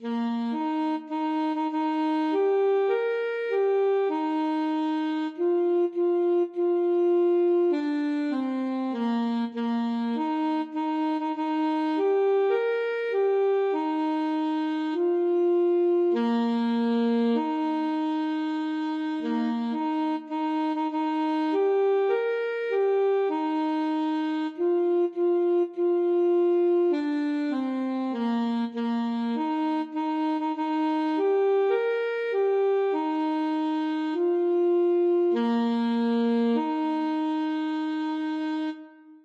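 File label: alto saxophone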